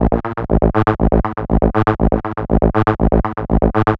Techno Synth

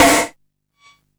Snare, Drum & Bass

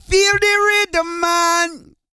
REGGAE, Vocal Planet World Voices

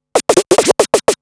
Scratch Fills 140BPM

